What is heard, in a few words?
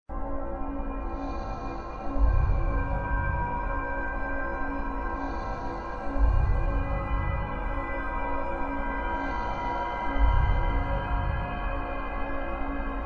Music Main; Soul of Demon Blade Monster; Android